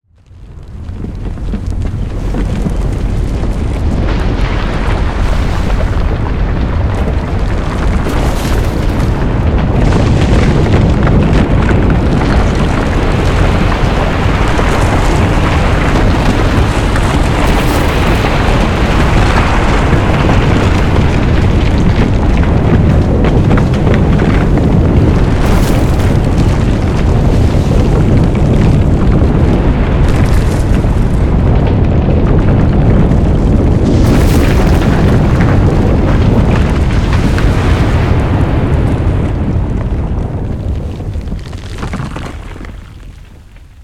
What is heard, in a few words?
Earthquake - Big